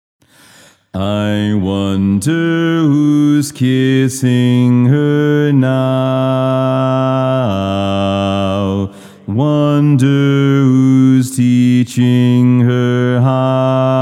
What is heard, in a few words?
Bass